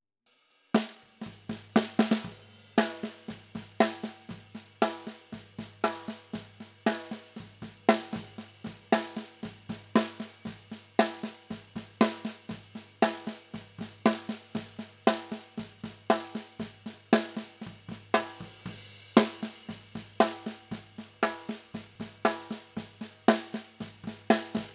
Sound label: Snare Bottom